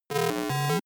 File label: broken computer low short